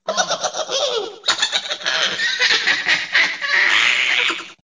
haha; minions

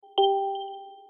Mercedes Benz Chime

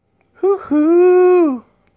Hooohooo

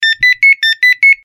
Real Tone

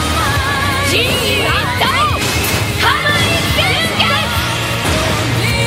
Transformation; Kamui

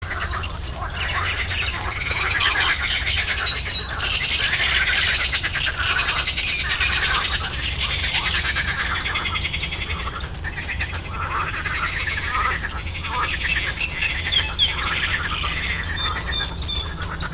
Frogs N Birds

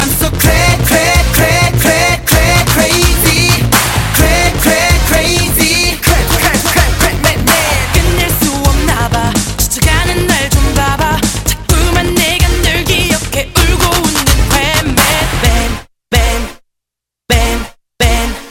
Clap Ending

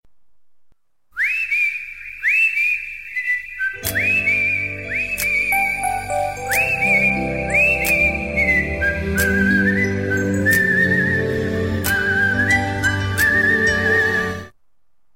Wistle

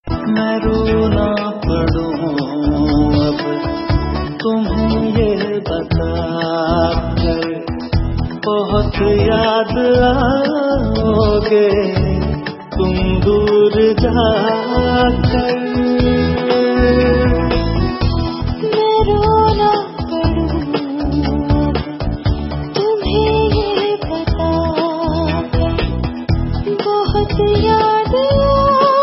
Duet

